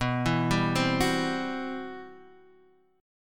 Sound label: C-Major Sharp 11th B, guitar